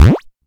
star knocked; Tiny Race

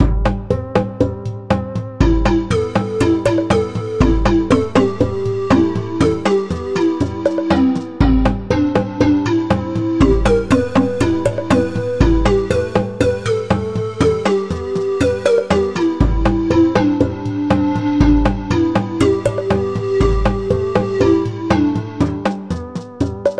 theme; CaveboyFall

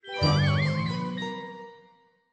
game over; Santa Run

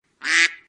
duck quack; DuckShooter

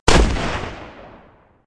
shoot; Viking Escape

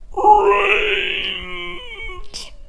zombie; CityHero

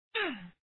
hit maces; Viking Escape

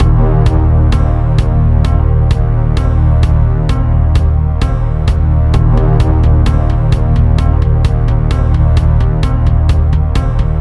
music intro; EarthAttack

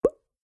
collision; Swift Cats

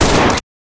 machine; Zombies Night

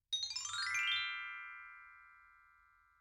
new level; Sweet World

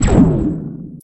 gun; EarthAttack

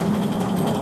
scale platform; Alpha-E-Corp

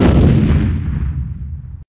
explosion; EarthAttack

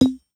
shoot fail; Space Shoot

